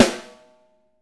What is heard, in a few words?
6X12 ALUMNUM, Living Drums